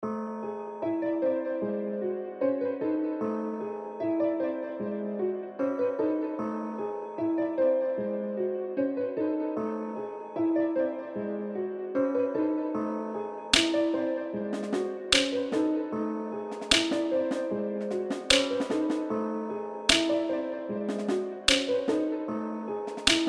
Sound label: trap beat